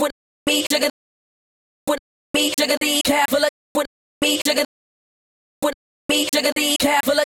Vocal Chops Bpm 128 Key D

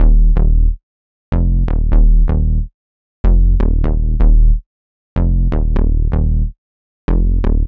125 D Jump Bass

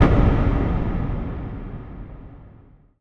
Dark Impact; Rapture vol1

